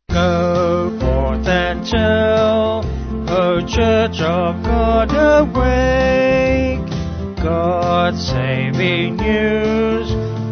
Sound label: Vocals Band